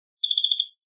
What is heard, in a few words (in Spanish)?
ave; la-chaux; choir of species